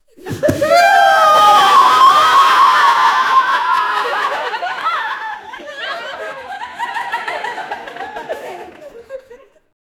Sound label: a live comedy club audien; audience